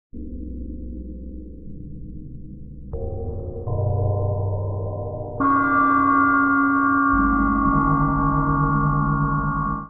Gong FX 80 Bpm; MIDI Clips; Instruments; Forgotten; Ableton; Drone Lab

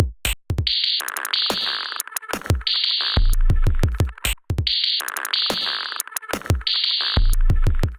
Kit, drums; Ableton; Factory Packs; Glitch and Wash

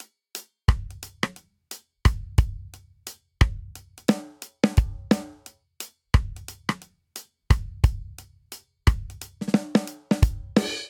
Swing Reggae Chorus 88 bpm; 4 4; MIDI Clips; Ableton; Factory Packs; Drum Booth

